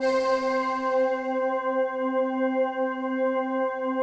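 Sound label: PAD, atmospheric pads; Best Service; XX Large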